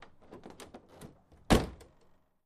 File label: Citroen Deux Chevaux, Door Open and Close. Medium Cu.